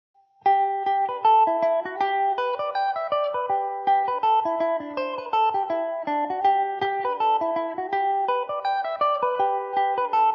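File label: G major mandobird, irish mandolin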